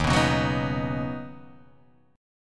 Eb-Minor 7th Sharp 5th Eb down Guitar Half Step Down; strummed